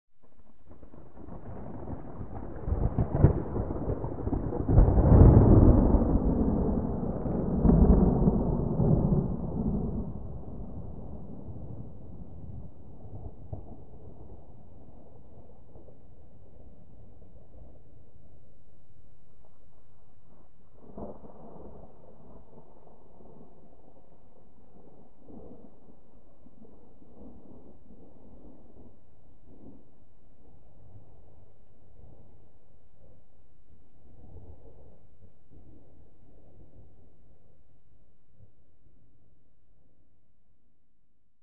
Thunder; Medium Distant Gentle Rolling Thunder with Long Decay